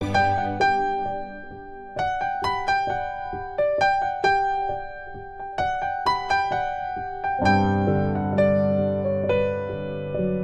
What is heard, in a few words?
emotional piano; Electronica